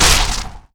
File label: projectile impact; dota; pugna